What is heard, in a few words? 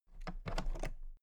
toolbox latches open very soft